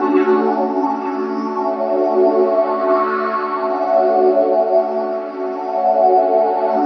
Pad; Sonic Foundry (Sony Creative Software); Synchro Funk

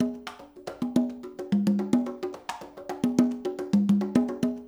loop, Percussion Slam; InVision Interactive

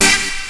RASTA, Hip Hop; E-MU Formula 4000 Series Vol. 1; Hip Hop Nation; Raw Phatt

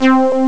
synth gong; Amiga Soundtracker Sample Packs

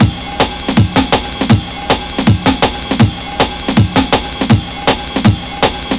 160 ride, Drum Loops, Drum & Bass; Total Drum&Bass

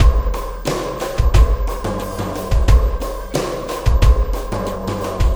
Sky Lab Beat, Processed Drumkits

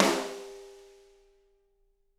SD, Drums; Bob Clearmountain Drums I

